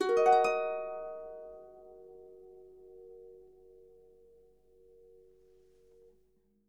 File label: D#, Percussion & Harp; Miroslav Vitous